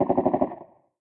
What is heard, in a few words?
fog shooting